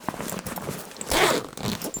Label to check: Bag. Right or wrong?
Right